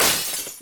Glass

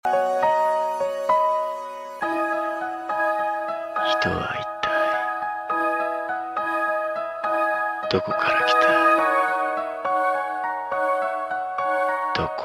Vocal

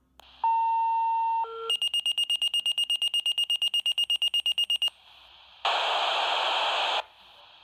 Fire Pager

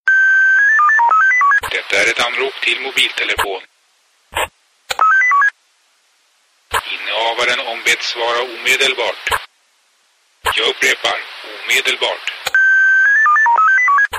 Police Radio

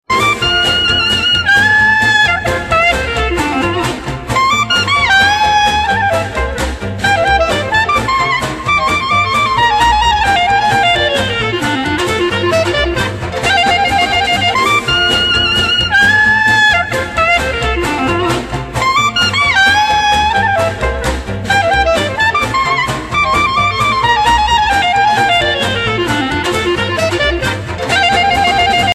Old Danish Jazz

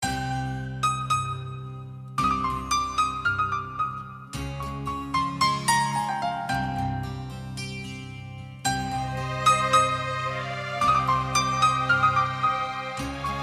Romantic Tone

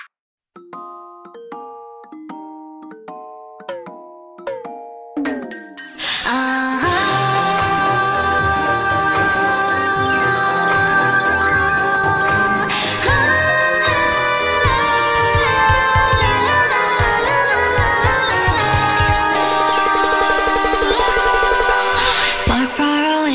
Oofer

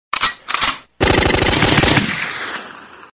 Machine Gun

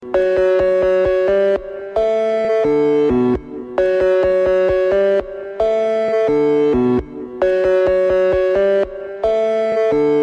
Synthesizer Ringtone

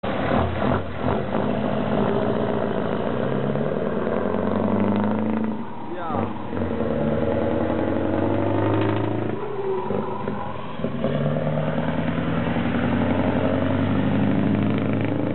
Scania V8; Red Poland

